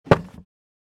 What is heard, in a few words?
Small wooden box container close